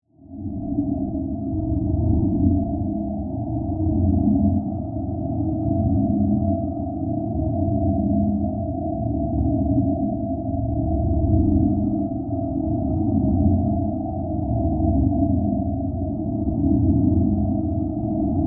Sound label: Bed Pulse Low Dark, Production, Element, Bed, Pulse, Low, Dark, Feedback, Eerie; Blast Wave